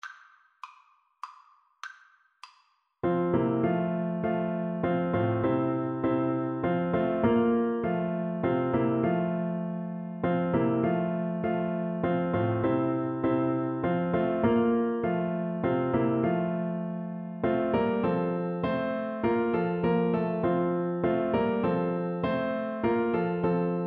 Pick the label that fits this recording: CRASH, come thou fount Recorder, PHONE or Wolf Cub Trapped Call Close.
come thou fount Recorder